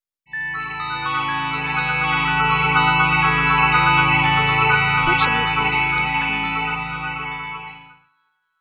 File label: atmospheric raid